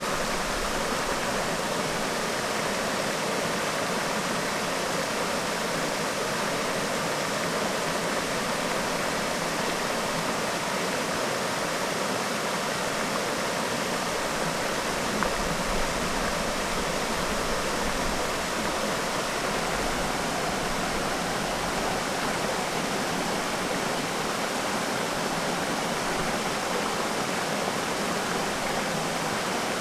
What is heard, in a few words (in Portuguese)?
Parque terra viva cachoeira; agua